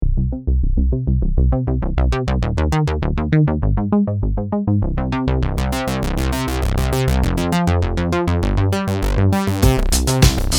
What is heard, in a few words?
classic analog arp; logic pro; arpeggiator and midi